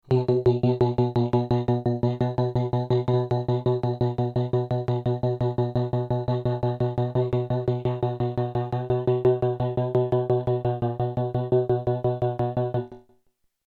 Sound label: pulse; soma the pipe